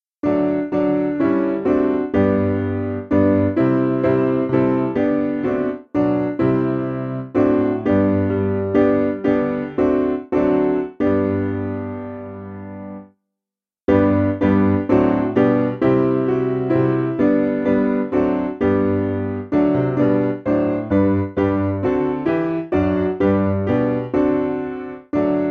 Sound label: Piano